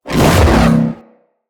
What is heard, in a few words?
Hoverboard Jump Spin; Vehicles; Venus